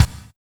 BD, Drum & Bass, 160 BPM; Ueberschall; Drum & Bass Flash Trackz

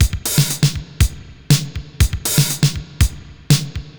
Spring Verb Compressed TR 505 120BPM

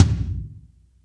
TOM G4 SFT L; Zero-G; Chemical Beats

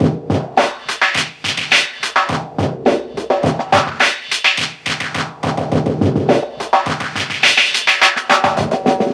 Beat, Drums, Eclectic Electronica

